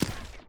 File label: robot step